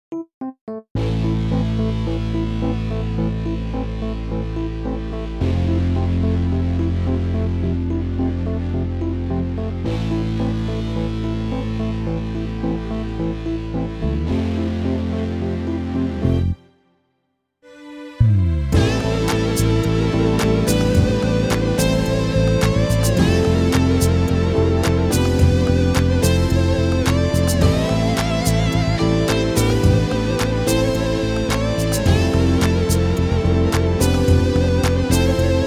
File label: Rockish drumless